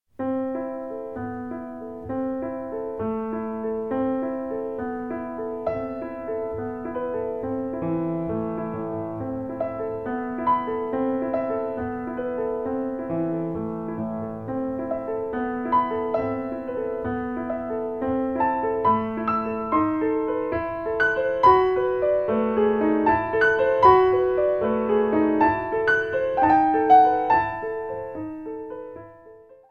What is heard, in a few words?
etude-tableaux a moll; rachmaninow